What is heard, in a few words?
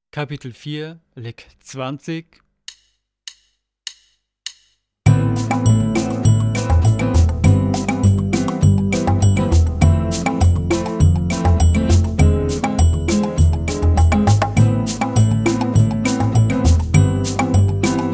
Latin Style Guitar